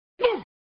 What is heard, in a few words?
Sound "Uuuh", flipper